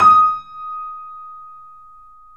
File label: D#, Boesendorfer, 3D Pianos; E-MU Producer Series Vol. 5; 3-D Audio Collection